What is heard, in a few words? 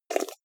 jar plastic midsize open fast